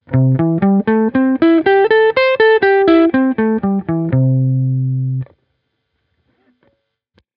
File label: blues, guitar